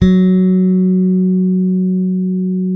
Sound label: bass sustain F#3; Sampleheads; Will Lee Bass Library